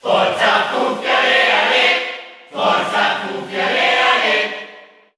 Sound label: Cheer Italian; SSBU